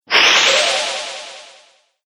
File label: Magical Air